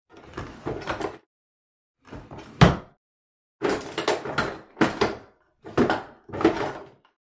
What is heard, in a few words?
Bathroom Drawer, drawers